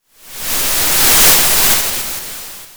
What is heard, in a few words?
Sound of waves crashing on a shore